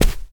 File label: Rug Run